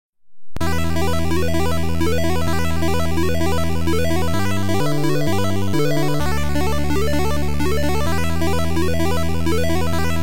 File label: 8 Bit Relax Chiptune Chip Music Instrumental